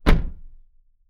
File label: car door close